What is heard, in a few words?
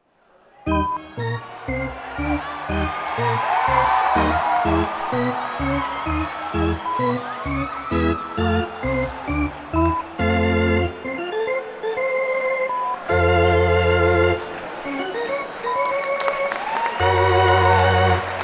baseball Music Crowd